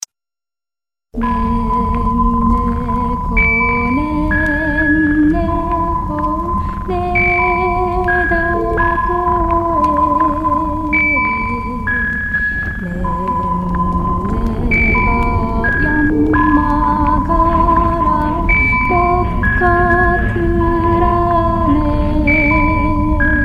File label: Beautiful Noises